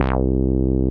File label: BAS, Eurotech; Sounds Good